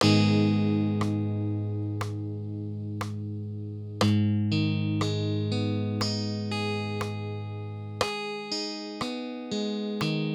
G#m rhythm

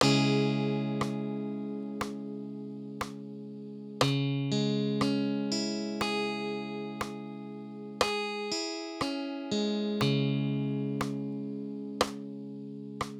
C#m rhythm

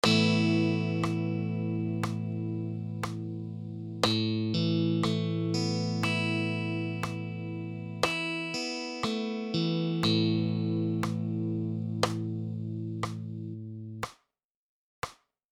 Aadd9 rhythm

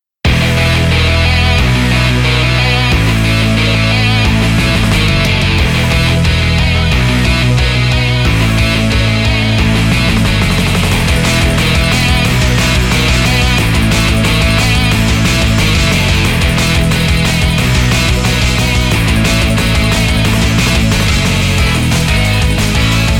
neorock, bgm; maoudamashii

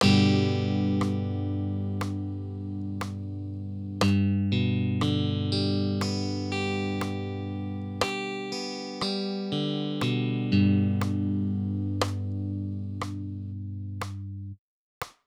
G rhythm

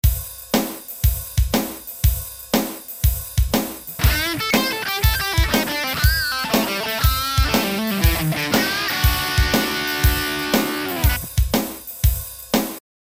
A mi penta

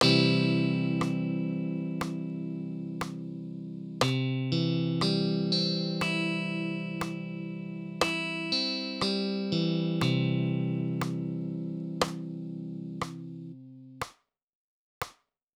C rhythm

